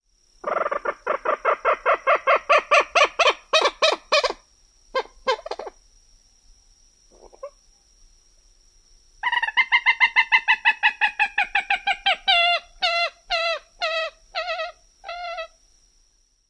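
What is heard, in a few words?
whinny like outbursts, Common Moorhen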